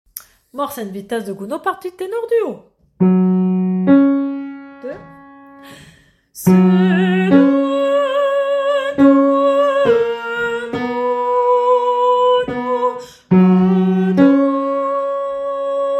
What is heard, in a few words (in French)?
partie tenor du haut; Mors et vita